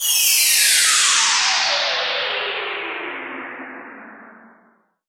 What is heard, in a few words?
Downlifter